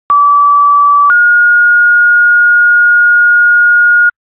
ems, tones

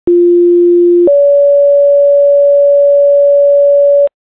page, tones

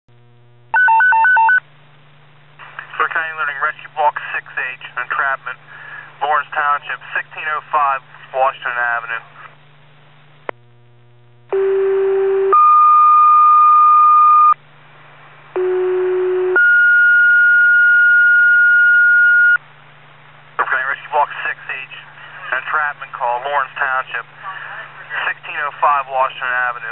snowblower; clearfield

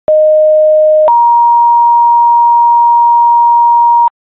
siren; clinton

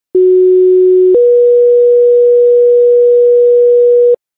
siren, tones; elk

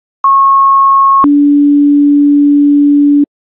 fire, tones

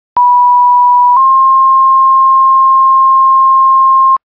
page, tones

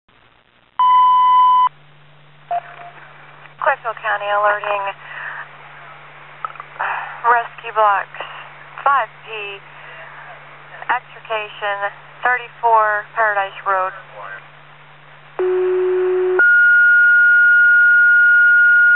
wheelchair pin; clearfield

